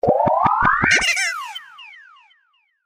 Funny Space

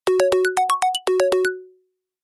New Sms

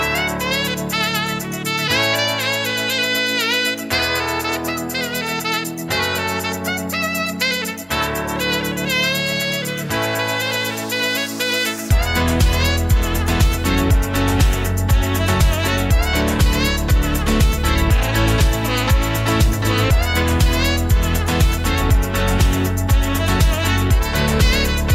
Blazing Saxophone

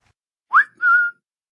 Lure Whistle

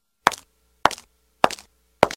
Heels Steps

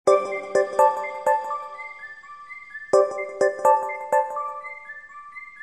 Cool Melody

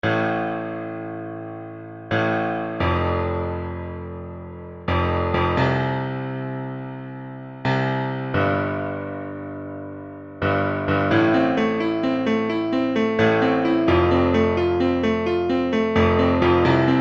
Beat

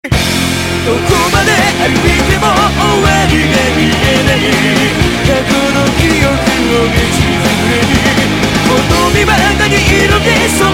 Theme Song; Claymore

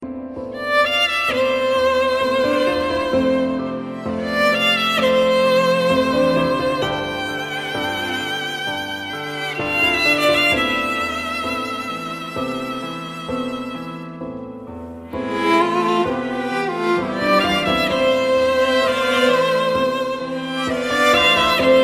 Gothic

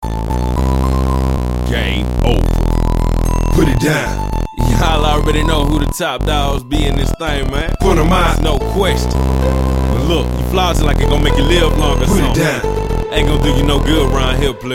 Video Game Victory

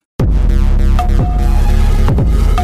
Bongo

